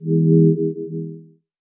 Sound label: chord